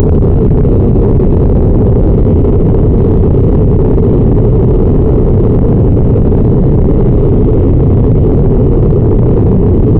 rocket engine sound; aerospace

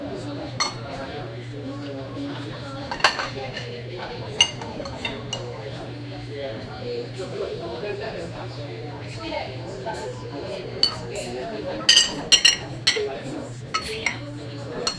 ambience of a cozy mornin, caf

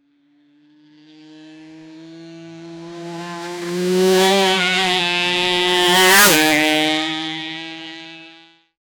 racing motercycle in high

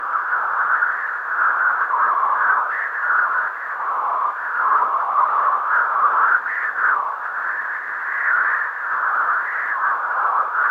generate radio static eff, horror ambience

